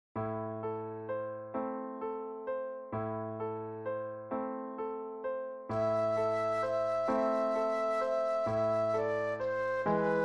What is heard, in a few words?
flute solo